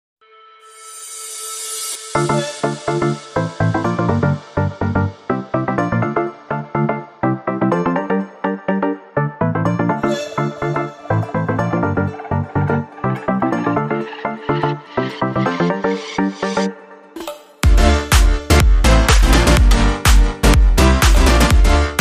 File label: Tropical Whistle, Rhythm; 60sec